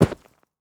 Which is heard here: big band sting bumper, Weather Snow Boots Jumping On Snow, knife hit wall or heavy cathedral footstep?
Weather Snow Boots Jumping On Snow